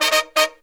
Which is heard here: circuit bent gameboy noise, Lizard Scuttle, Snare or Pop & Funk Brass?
Pop & Funk Brass